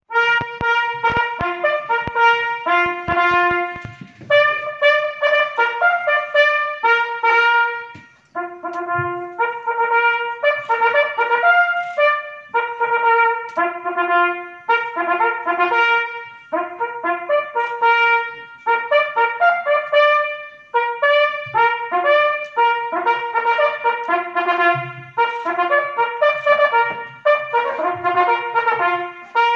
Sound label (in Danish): fanfare 1 stemme